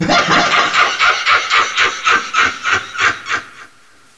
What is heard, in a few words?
ghost